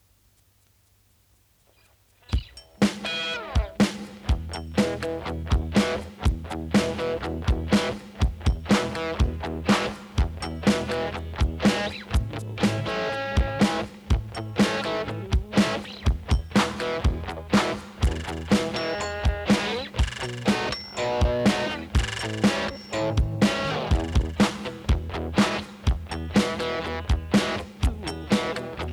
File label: Elec Guitar Blues Vocals